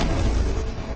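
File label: chopper; BALLY; CORVETTE; Corvette-Original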